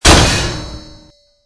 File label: stomper med